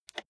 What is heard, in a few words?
rotary control single turn soft